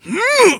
voice attack

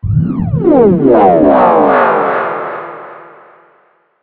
poison

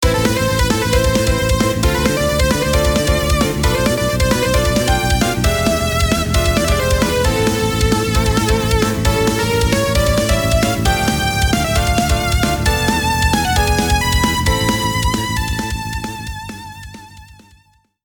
tension melo

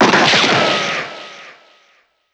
Gunshot

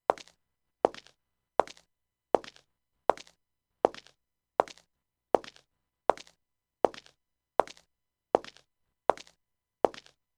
Footstep slow

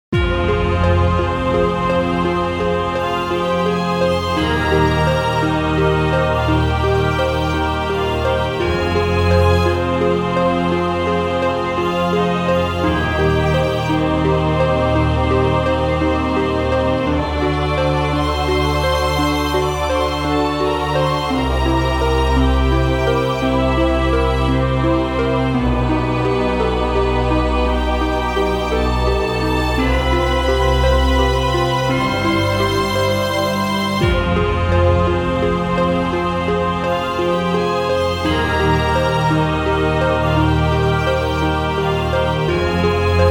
GameOver

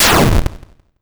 fire sword

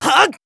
voice attack

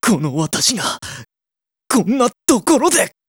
voice damage

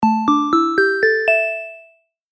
synth bell

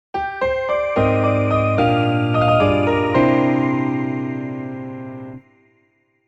jingle piano

